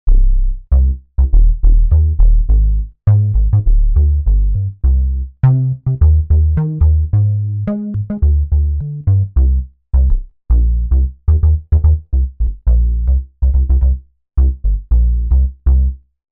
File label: Analog Bs; Factory Patches